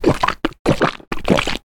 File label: swallowing